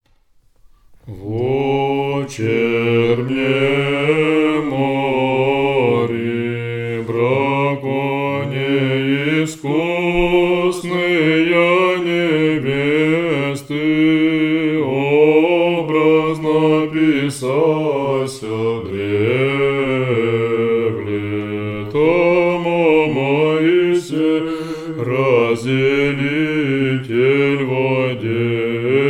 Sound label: dogmat tone 5